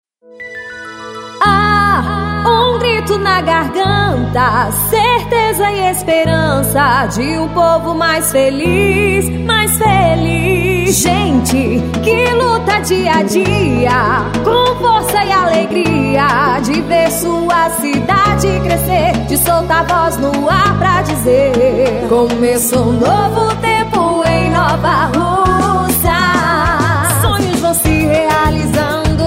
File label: JINGLE; PREFEITURA DE NOVA RUSSAS